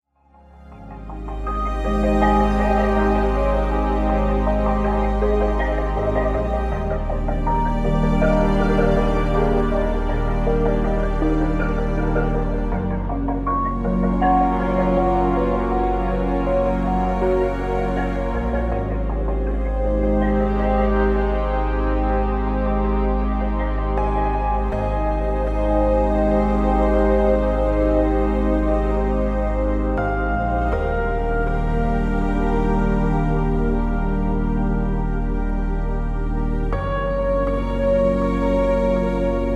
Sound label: Deep Relaxing